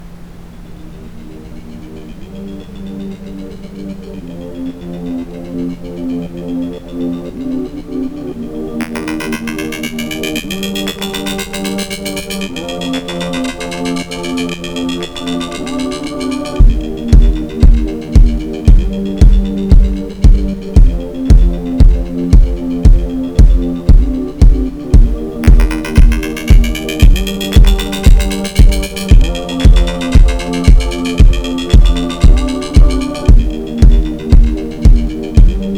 Sahasrara Spirit mit Ambient Sound; chakren

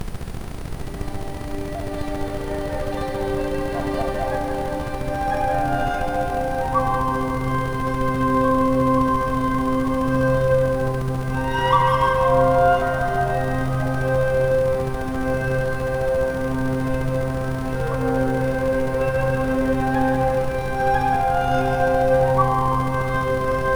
Rife Fokus Fluss mit Ambient Sound; rife frequenzen

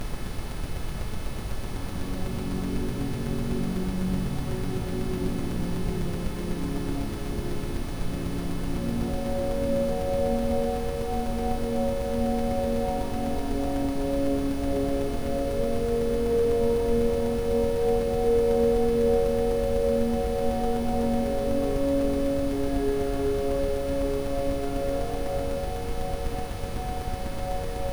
Rife Quantensprung mit Ambient Sound; rife frequenzen

